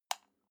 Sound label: light switch off soft